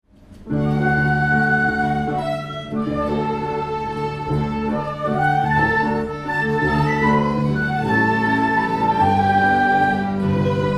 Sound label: Waltz, bush music in arranged parts